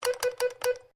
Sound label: Morse code H; Morse code aplphabete; Morse Code (Sound Effects:Electronics)